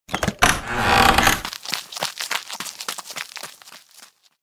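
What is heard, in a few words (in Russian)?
otkrytie dveri so skripom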